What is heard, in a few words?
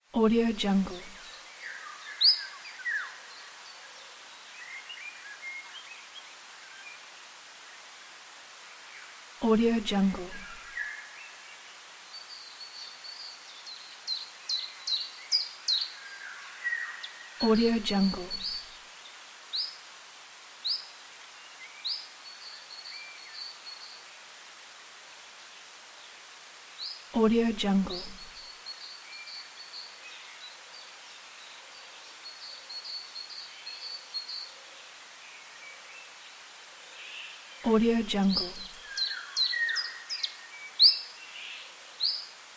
forest; crisstyna